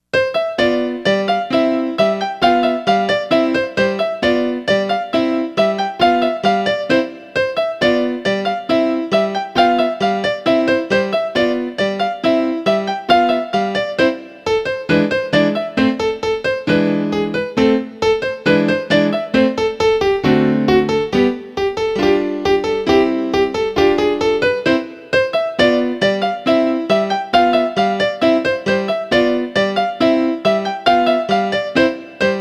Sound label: piano